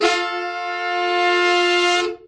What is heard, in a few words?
swelling triad, horns and brass